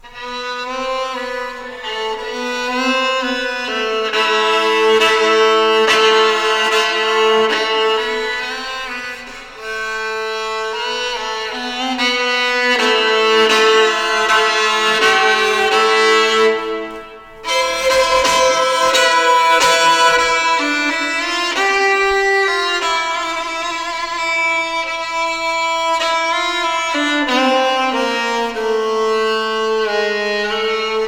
fiddlin on my violin

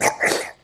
Gag; Lewd SFX

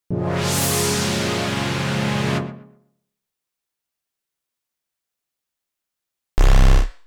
pads + a bass note; 129BPM; Pony Fan Music Remix Stems

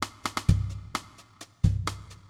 drum; Pony Fan Music Remix Stems

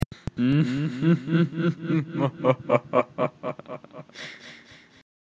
evil laugh

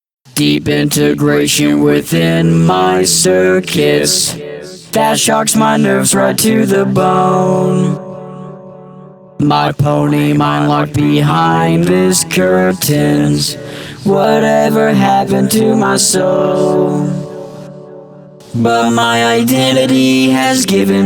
Vocals

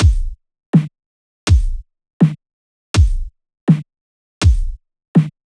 Beat punches; FL defaults; Pony Fan Music Remix Stems

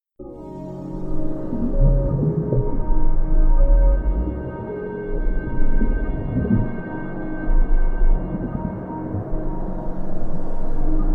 Sonar

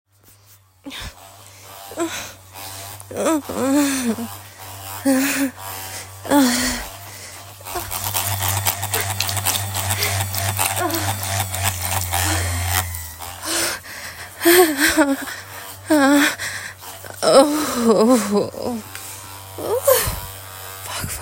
MOANING, British; F4F; Ramble; Erotic audio; F4M; F4A; NOW THAT S WHAT I CALL MOANING 24

